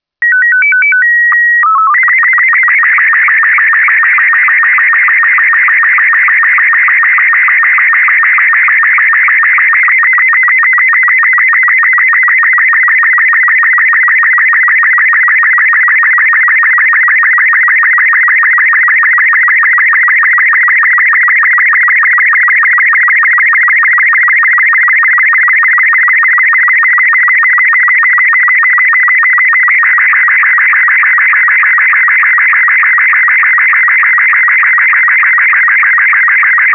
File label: Robot36C